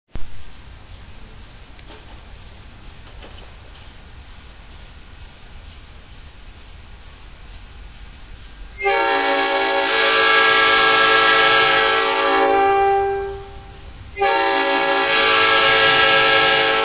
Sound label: Nathan P5, Horn